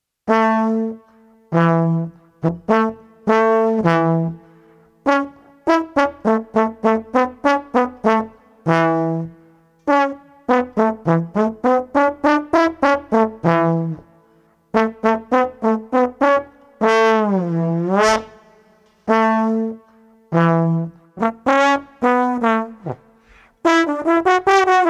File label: Street Trombone Bounce Walk 100